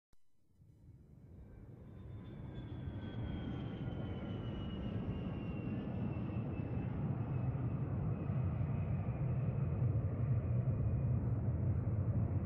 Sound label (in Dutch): vlieg